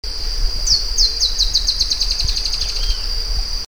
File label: Drymophila malura ball